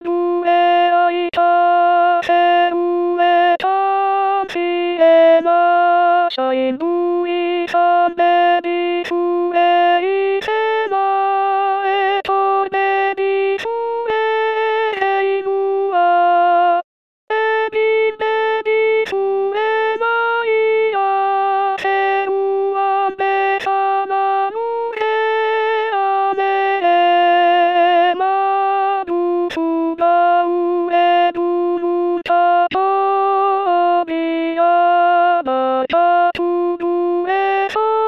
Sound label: Alto